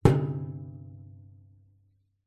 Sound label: metal tool tray empty knock bash resonate; foley; zapsplat